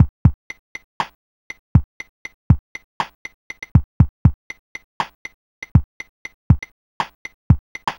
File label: Apple II Chill Beat, Drums, Chiptune; Sonic Foundry (Sony Creative Software)